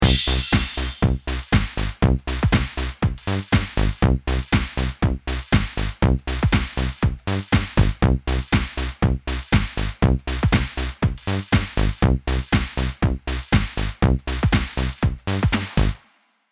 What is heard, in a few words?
Pinsel Bass 8tel new groove